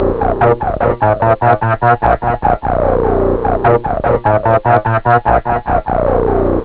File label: happy; OctaMED SoundStudio V1